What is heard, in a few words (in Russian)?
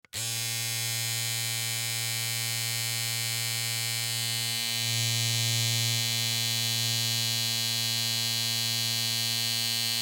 zvuki mashinki dlia strizhki volos